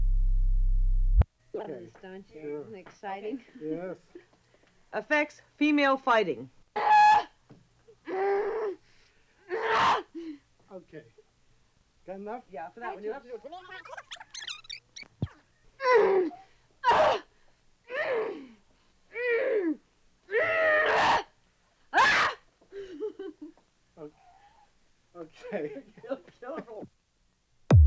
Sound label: Female Fighting Grunts